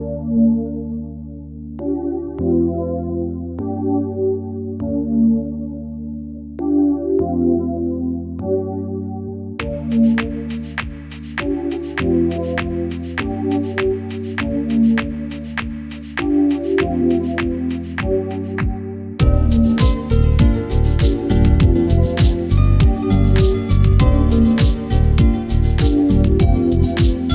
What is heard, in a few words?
chilling funk 432 hz